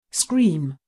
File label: scream